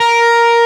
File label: arp; osu